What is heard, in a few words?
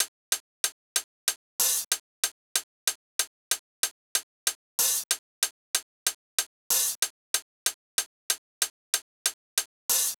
Hats, 094, Cmin, Drum Tracks; big fish audio; Hip Hop Exotica